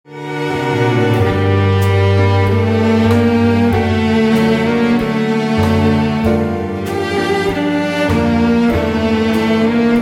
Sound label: orchestra